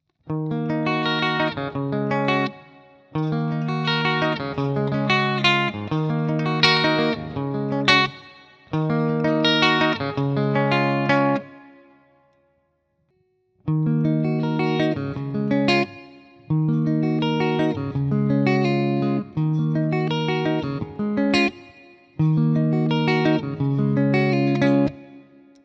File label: split; harley benton hb 35plus